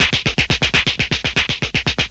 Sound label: clappin; Drum loops